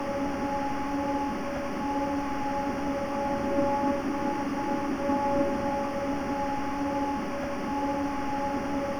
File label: Traction blower - Tunnel, Train; OpenBVE; Cl313 Silverlink